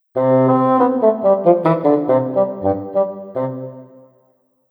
fagot

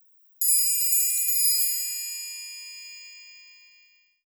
triangel

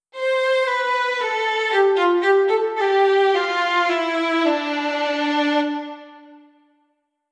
husle

